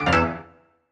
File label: scatter Hit; excalibur